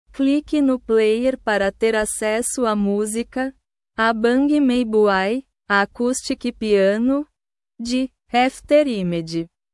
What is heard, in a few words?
acoustic piano